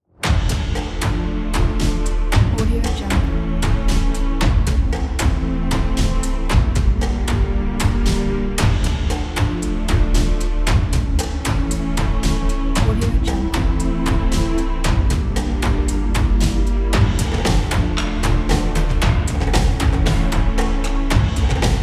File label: dark cinematic drums